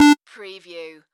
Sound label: Beep Modulated Single; sound-effects-bible